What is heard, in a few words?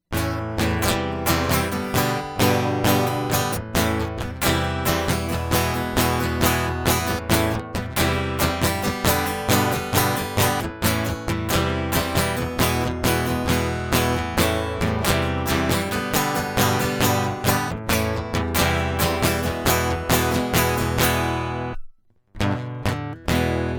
Lifeboat Loop; hyper terrestrial